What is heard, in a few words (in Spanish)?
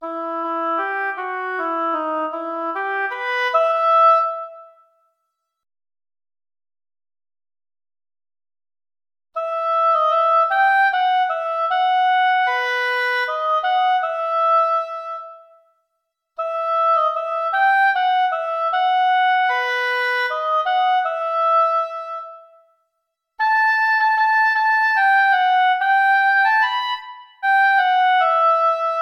duo para oboe fagot; virgen caminando; traditional